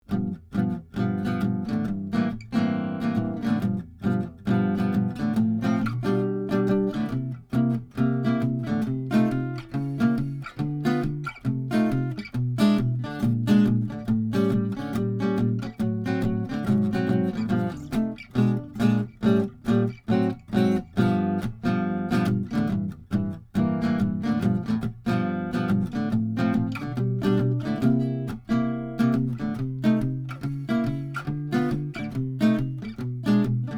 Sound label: Ocean Jazz; Cascade VinJet